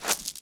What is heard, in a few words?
gravel jump; firefight